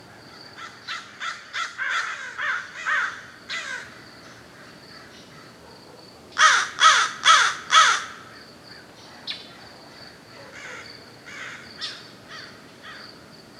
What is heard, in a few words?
birds; Golden Crayon Sounds